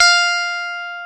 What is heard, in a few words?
guitar, e-guitars